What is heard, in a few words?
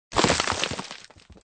Rock crumble; SFX Environment; The Binding of Isaac